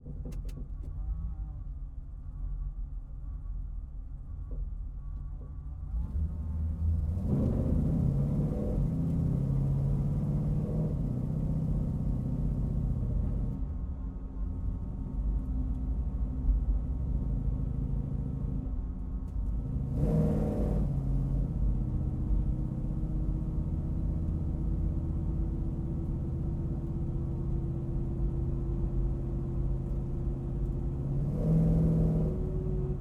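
dodge challenger 1971 onboard drive gearshifts interior; Dodge Challenger R-T 1971; DPA4021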